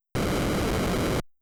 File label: fire; mother1